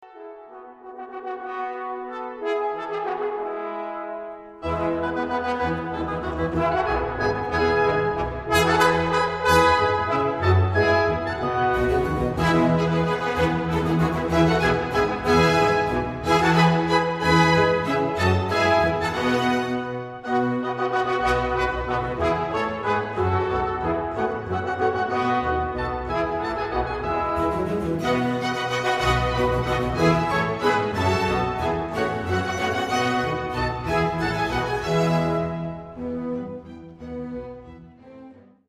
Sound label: Minuet, Horn